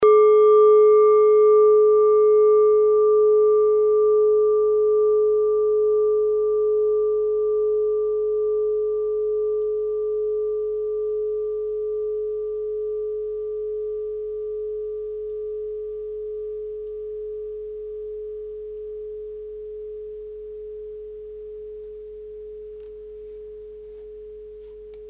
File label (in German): klangschale nepal, Klangschalen Nepal